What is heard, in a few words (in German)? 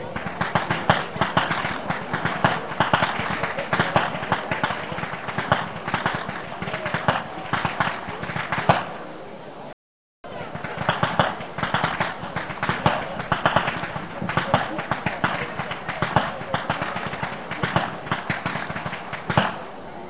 schnalzen, jugend; Freidling